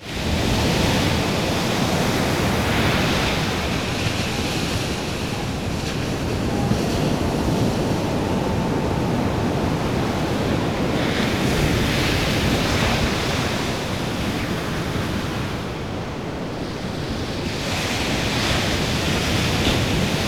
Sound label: sea surf extremly; best service Blue Box; sea ocean